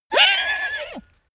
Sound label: horse neigh short; natur-tiere; pferde